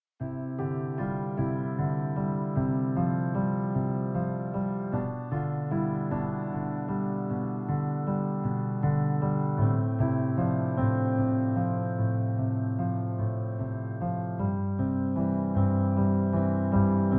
piano

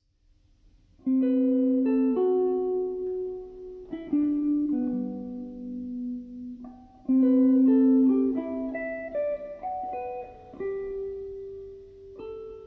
meditation

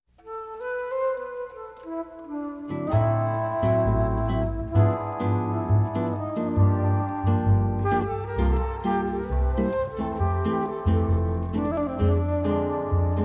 guitar

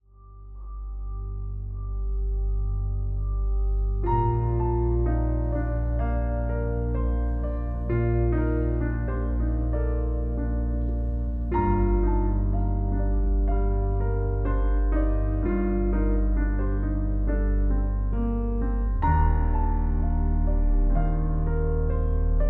piano

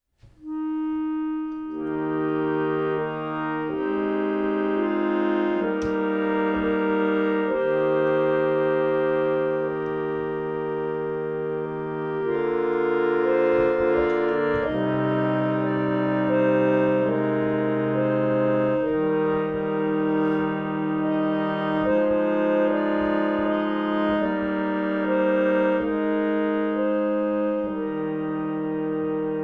reed organ hymns

